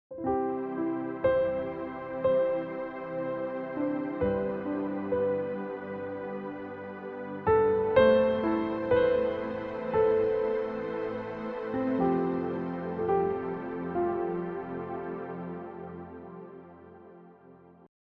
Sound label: Dramatic Piano Pad